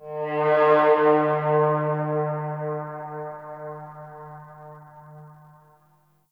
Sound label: Temple Far Horns; Ambience; Corpus Ship; Temple One